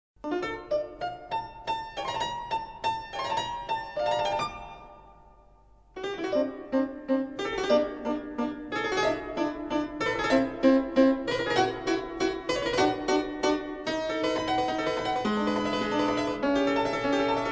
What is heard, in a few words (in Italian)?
fortepiano; cordofoni; il pianoforte